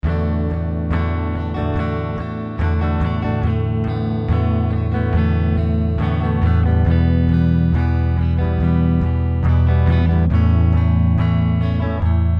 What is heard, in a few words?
grunge